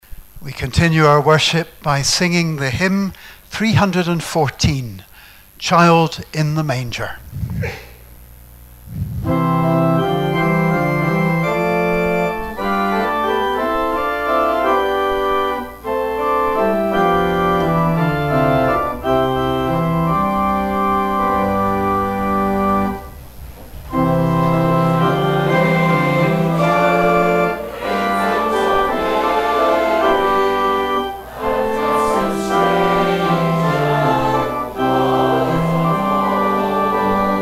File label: hymn; 'Child in a manger'; watchnight